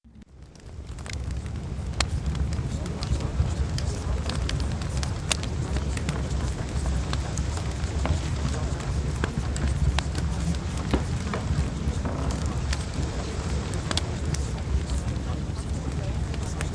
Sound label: Fireplace; Remove Subconscious Blockages